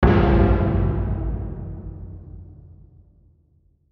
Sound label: DARK DRUM HIT, Dark Drum Hit Descend; Blastwave; Scary Tone Sound Effects